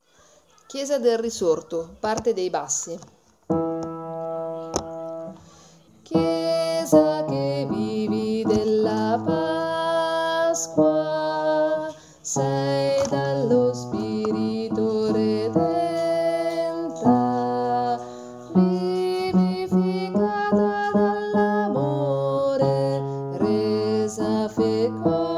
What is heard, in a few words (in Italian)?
bassi